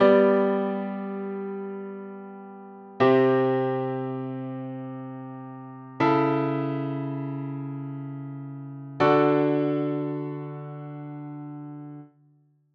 modulation applied dominant; modulacao

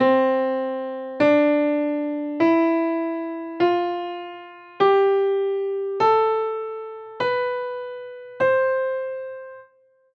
scale C major; escalas

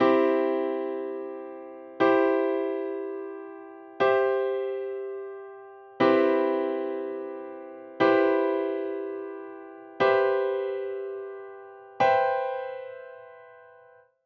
figured bass; baixo continuo

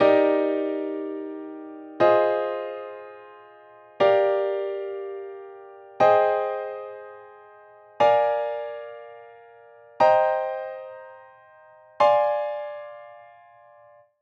harmonise Eb major 7ths

